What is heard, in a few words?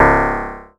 C#, PIANO; Big Fish Audio; Synclav FM